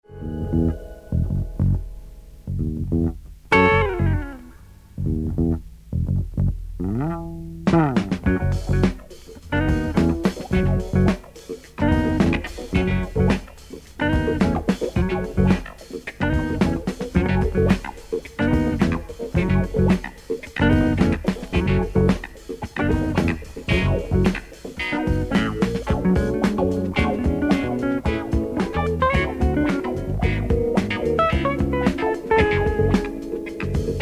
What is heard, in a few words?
music meditation